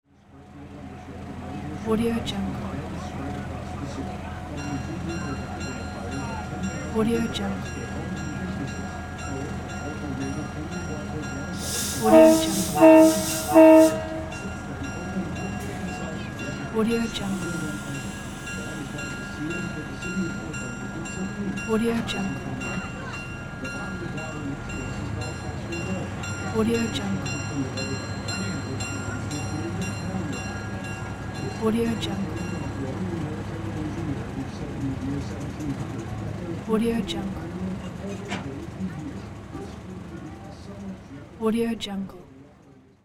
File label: small passenger tour train